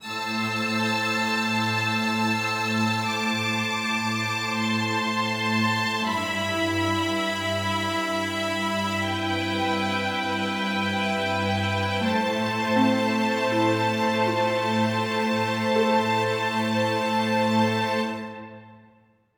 Game Over

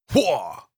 effort grunt male

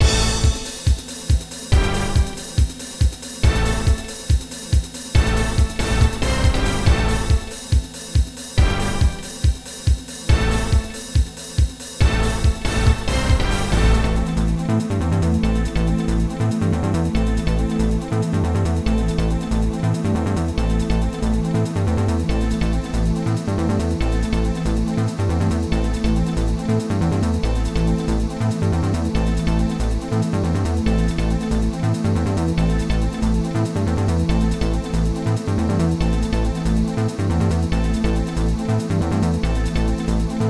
News Jingle

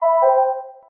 elevator ding

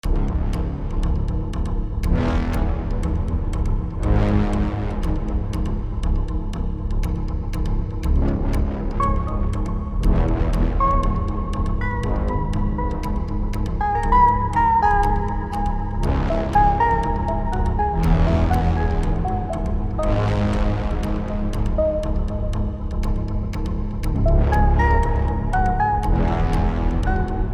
synth wave

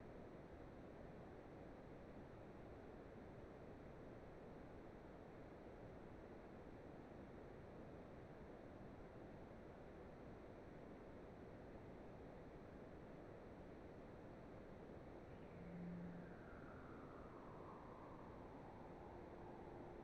ambiance loop

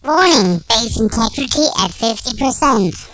Base Perc

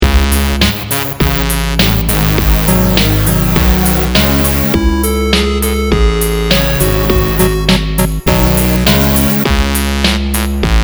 ominous groove

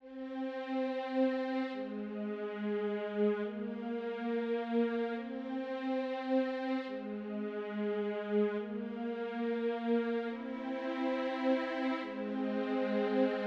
build transition

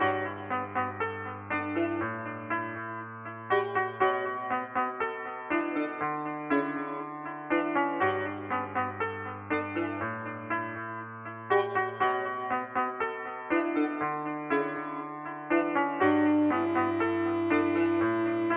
jingle

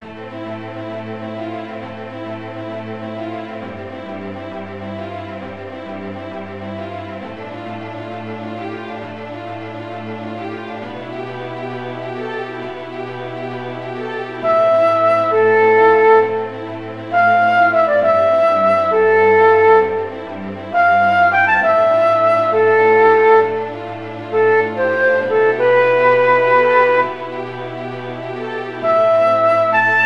Happy Music

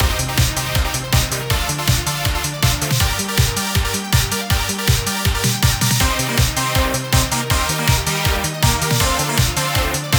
neon sign circuit climax bpm160

